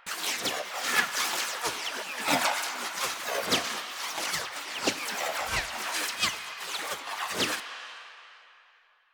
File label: Stalker Parrying Whizbys Loop; Warframe; Quests; Jade Shadows